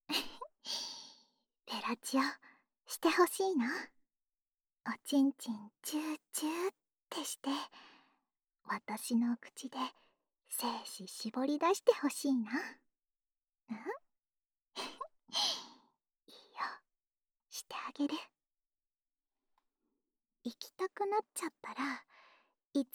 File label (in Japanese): オナサポ用フェラチオループ, ASMR